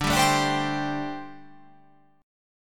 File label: D 7th Suspended 4th, down